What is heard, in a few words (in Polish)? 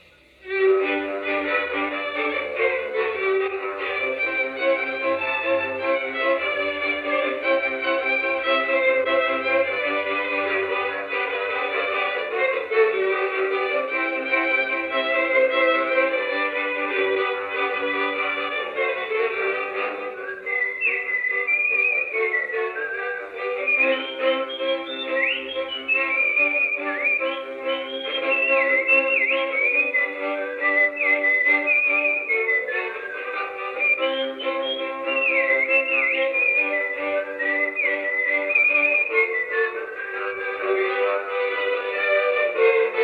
instrumentalny dygacz; z gwizdaniem otworz mi marys